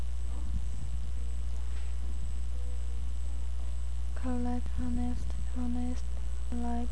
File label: correct